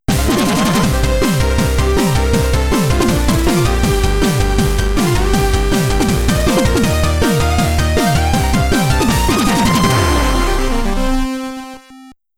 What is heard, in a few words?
Fanfare